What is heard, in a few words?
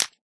new ignite, fire; Minecraft